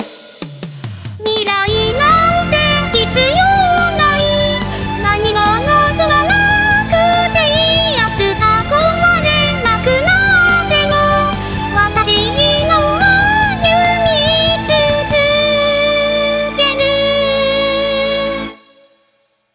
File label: song